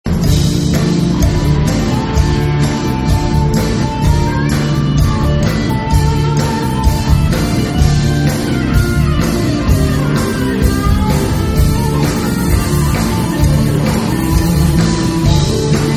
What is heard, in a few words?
R&R Solo